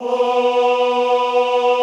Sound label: OHH B2, Classical Choir; Best Service; Extended Classical Choir